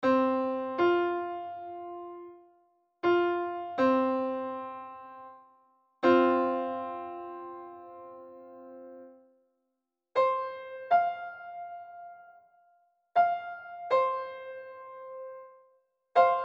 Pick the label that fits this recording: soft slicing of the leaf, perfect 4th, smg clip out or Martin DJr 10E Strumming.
perfect 4th